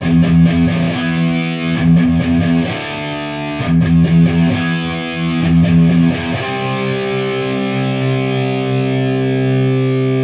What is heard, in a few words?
room, amp